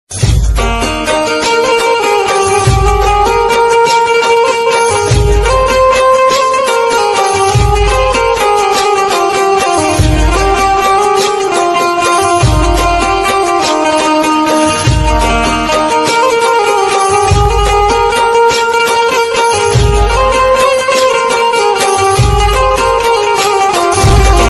navratri garba song ringtone, gujarati instrumental ringtone